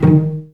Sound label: CL PIZZ D2; Best Service; Peter Siedlaczek's Advanced Orchestra